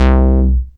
Basses C; Vengeance Samples

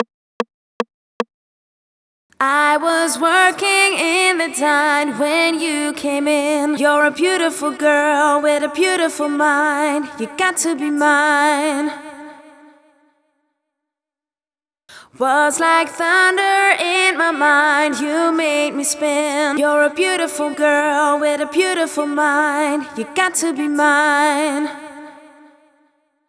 Verse FX Girl, 150BPM; Vengeance Samples

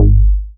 Offbeat Bass G#; Vengeance Samples

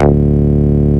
Bass D, Root D

